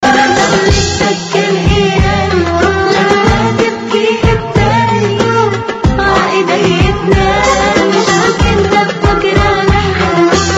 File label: Arabic Love Blues